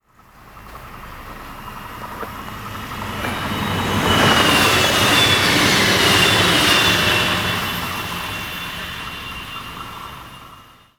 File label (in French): trains diesel et electriques; sonotheque